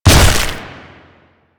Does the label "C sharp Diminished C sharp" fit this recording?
No